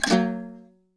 HALAM PICKS; Best Service; World Colours

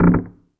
FX Sounds, Drum Machines

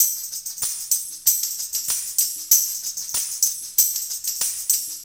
TAMBO CORPS 95

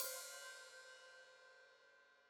Hat Open, Hi-Hat

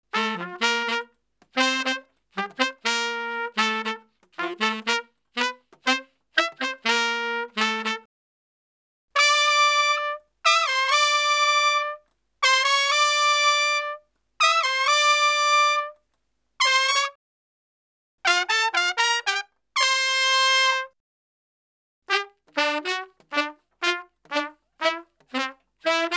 Salsa Picante; Masterbits; World Zone VOL-3

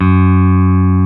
ELECT. BASSES; OMI - Universe of Sounds

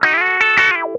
CROAK LICK, Funk Guitar; Zero G - Funk Guitar

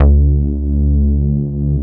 Synth Bass, Roland

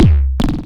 Kick Triplet; Cwejman S1; 300 Drum Machines; DevSnd Cwejman S1 - JK

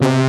LINEAR SYNTH

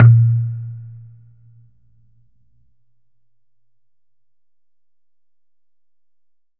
COCONUT WET

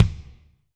Kik Wood Room, Drum Machines Alesis D4

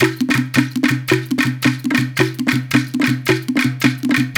110 Hawaiian, World Dance Beats; Spectrasonics - Supreme Beats

